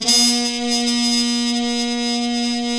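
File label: BRS, Harmon Trumpets Section; Roland L-CD702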